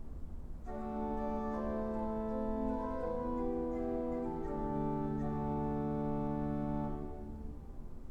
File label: violone, organs